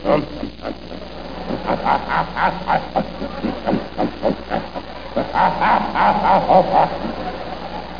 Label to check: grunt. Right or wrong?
Right